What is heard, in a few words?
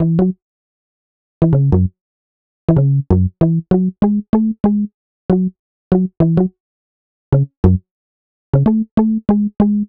E-Bass Pluck, Cinematic Funk; Sonic Foundry (Sony Creative Software); Cinematic Funk Urban Tone Poems